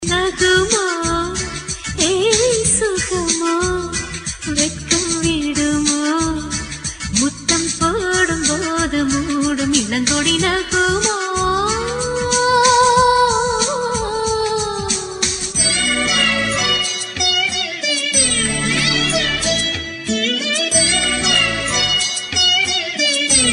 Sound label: Tamil Movie BGM; Nagumo; Arunachalam